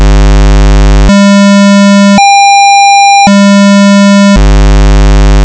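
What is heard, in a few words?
pulse, vocoder carrier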